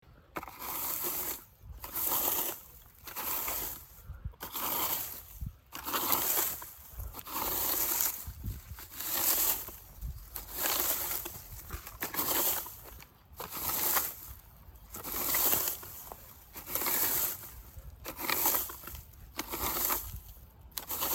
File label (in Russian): grabli plastik listia